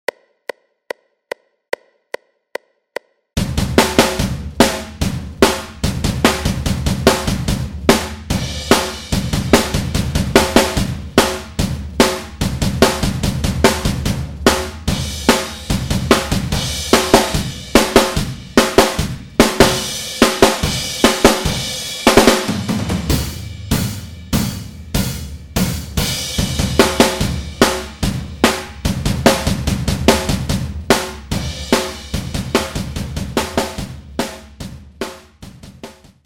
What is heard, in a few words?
drums fast